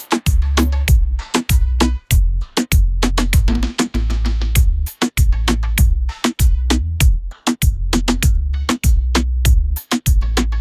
latin reggaeton beat; ai

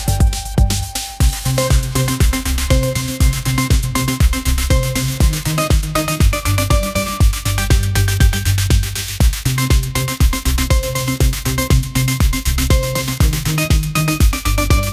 upbeat pop melody; ai

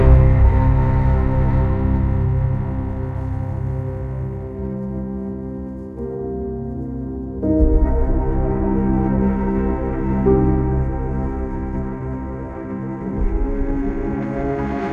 orchestral strings

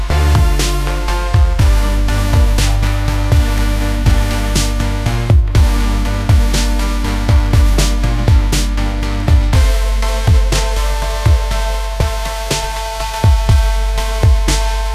upbeat pop melody; ai

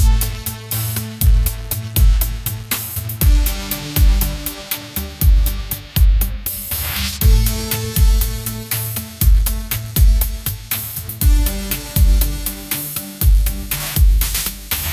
upbeat pop melody, ringtones

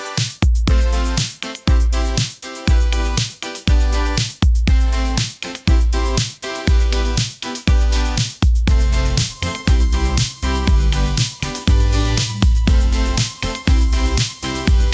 upbeat pop melody, ringtones; ai

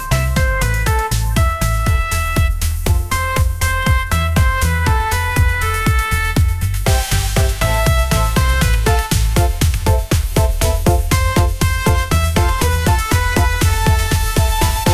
upbeat pop melody; ai

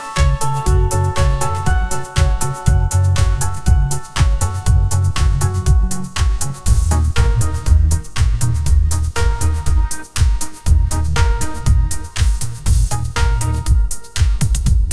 upbeat pop melody, ringtones

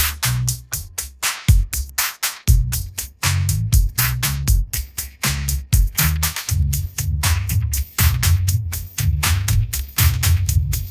upbeat pop melody, ringtones

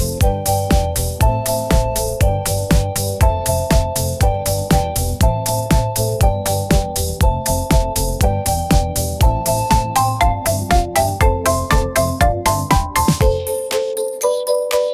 upbeat pop melody

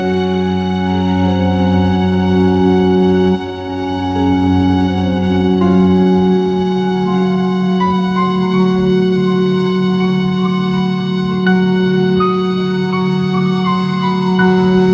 elegant cinematic strings and piano, ringtones

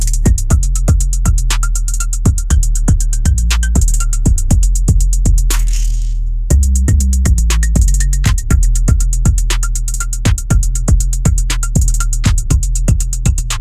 bright lights dark beats

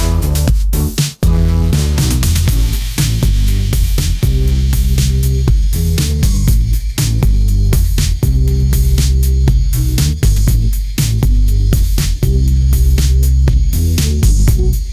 upbeat pop melody; ai

